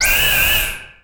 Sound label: tech fx; Best Service; Hyper Dance; EFFECTS